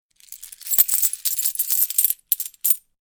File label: Coin Rattle; Quick shake or rattle of coins in hand.; Money Sound Effects; Lynne Music